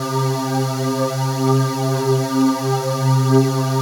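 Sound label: SEA pad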